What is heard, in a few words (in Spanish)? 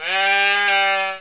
oveja, animales